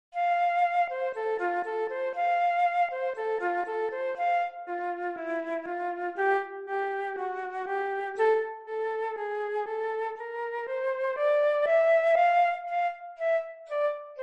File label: Concerto Flute Harp